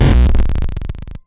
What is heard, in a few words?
die, ATARI